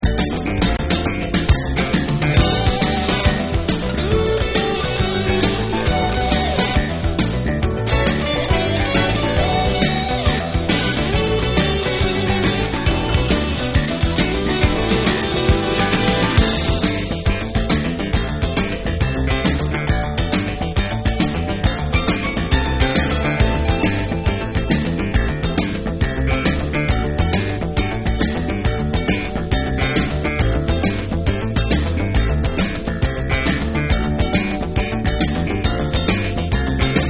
Italian Fuzzbox Version

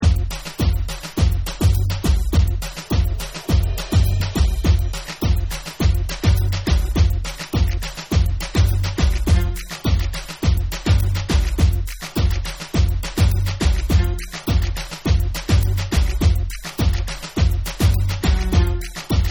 Instrumental

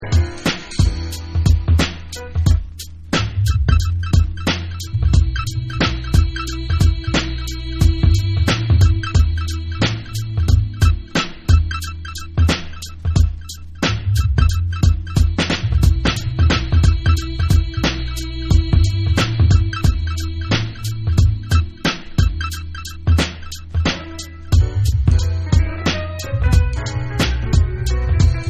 Instrumental

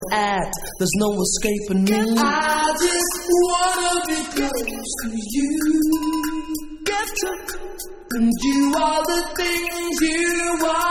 Acapella Drum Mix

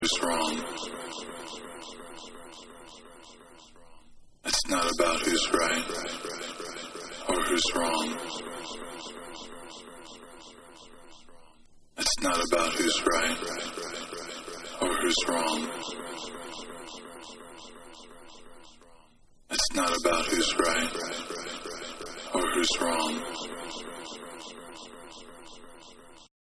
Acapella

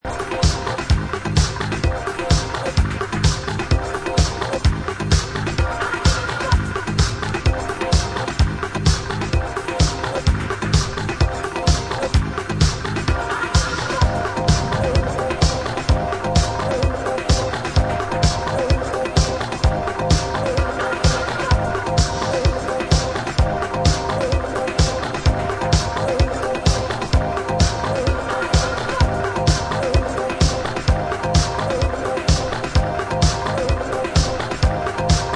Dub

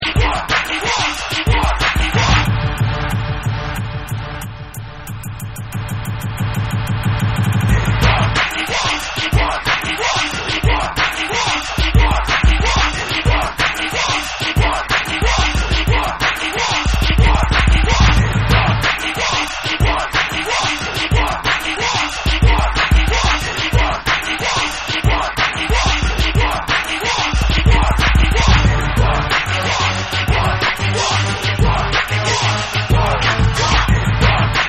Instrumental

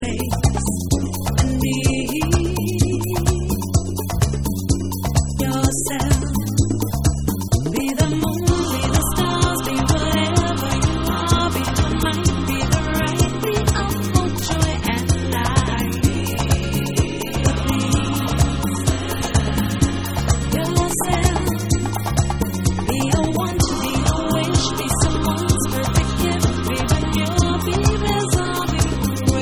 Vocal Mix

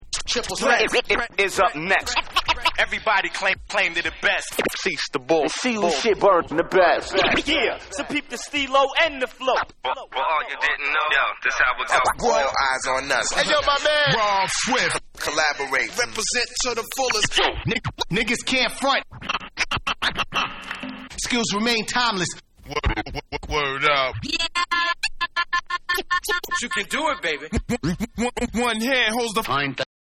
Scratchapella

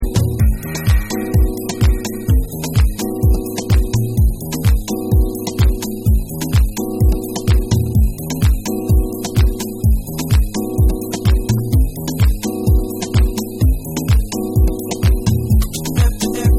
Soul Dub Mix

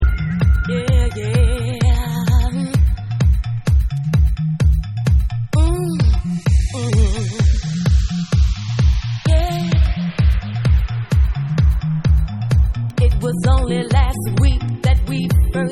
Vocal Mix

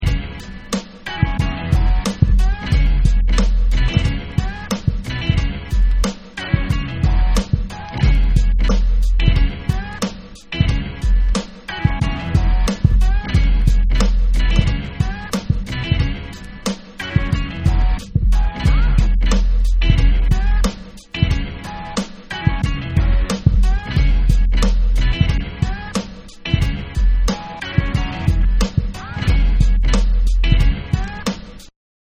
Instrumental

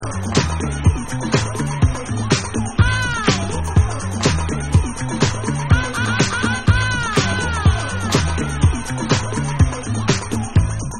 Beats

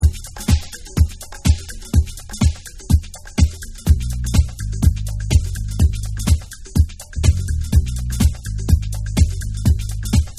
Beat Mix

